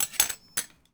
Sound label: Metal Rattle Grab Tongs; Foley - Rattles